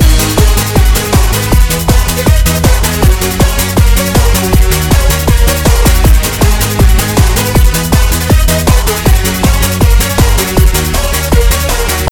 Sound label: game music; cstrike; jail bail